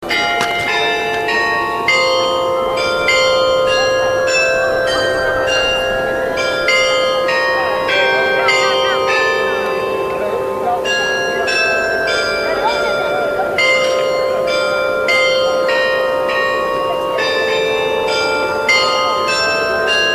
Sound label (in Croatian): zvonovi